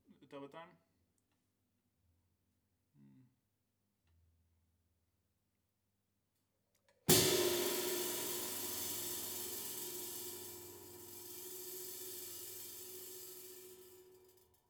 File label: Ride